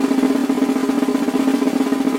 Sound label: drumroll